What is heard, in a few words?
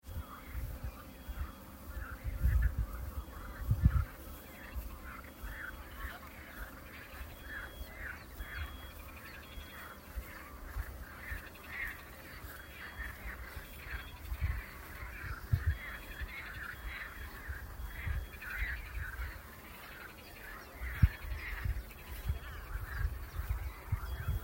Frogs and Beeps; muhr am see germany; may 2026